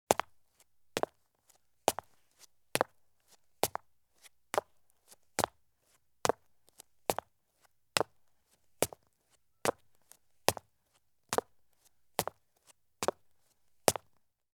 Women's Thin High Heel Shoes Take Slow Steps on Concrete Stairs; Sound Ideas; Footstep Sound Effects